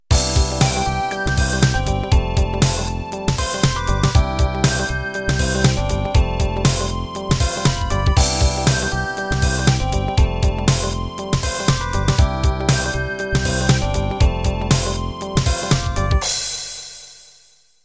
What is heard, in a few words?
Hip Hop Intro